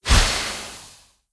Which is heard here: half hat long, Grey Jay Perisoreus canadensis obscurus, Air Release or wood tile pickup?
Air Release